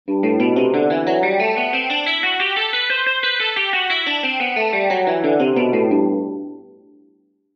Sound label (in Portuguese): Exercícios Guitarra 120 bpm